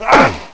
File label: hit